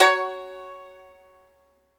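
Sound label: Hardanger Fiddle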